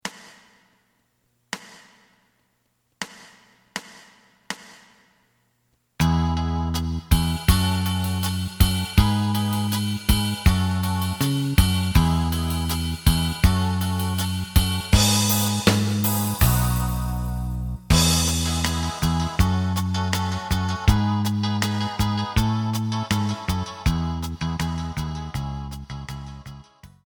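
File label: lead guitar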